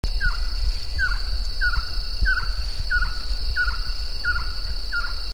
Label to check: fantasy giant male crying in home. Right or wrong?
Wrong